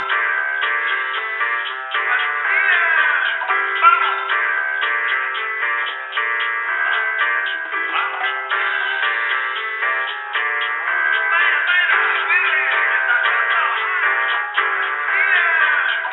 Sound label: Radio Loop; Jazztronauts